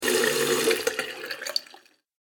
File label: Water Filling Bathroom Sink